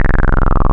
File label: PWM, wavetables; Animoog